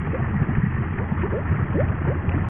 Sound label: Slime Beam Big Loop